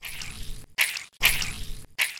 Blood Squirting

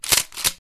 Shotgun

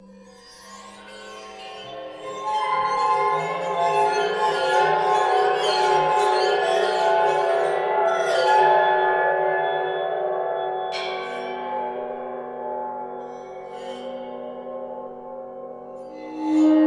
waterphone; daniel simon

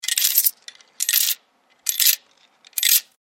Coin Drop; Willem Hunt

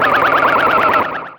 Martian Death Ray; Mike Koenig

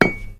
anvil impact; stephan schutze

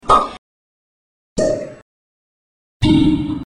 plop

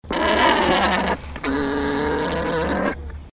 Alpaca Mating Call; Stephan Schutze

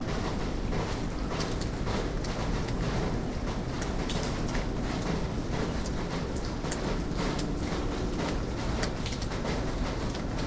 Night Rain In Russian City

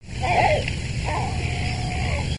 Greater Black Gull

